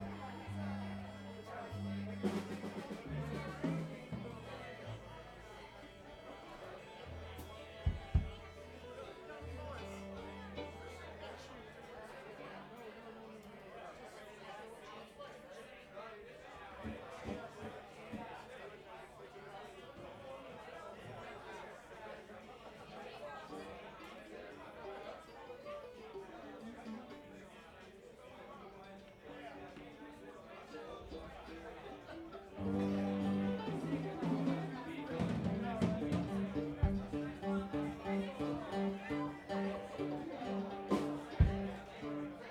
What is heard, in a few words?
Tuning; RodeNT5